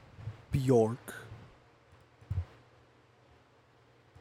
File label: Bark